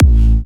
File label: big kick